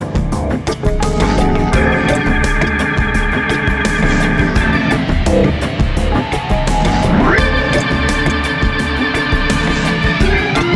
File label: hammond